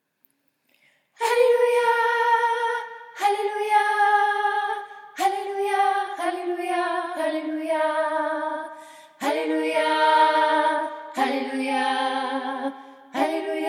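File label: Hallelujah Shona with improv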